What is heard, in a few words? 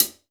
juicy closed hi hat sound c key; tuned drums